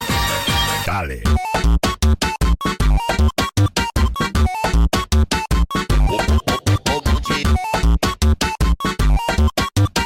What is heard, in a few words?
beeper funk